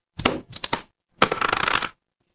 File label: impact dice roll